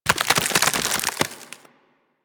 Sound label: Ice Wave Spawn; Enemies; Abilities; Warframe; Corpus; Arachnoid Camper Terra